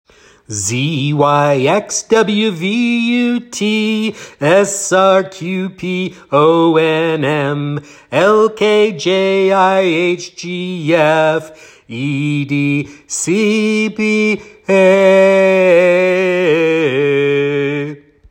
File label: Alphabet Song Backwards